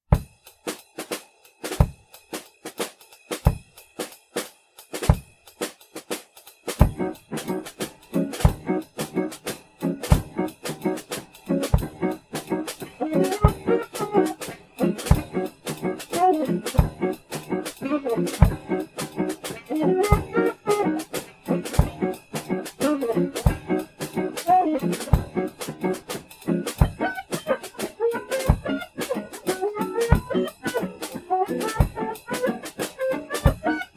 percussive, Phase based harmonic percussive separation; reference algorithm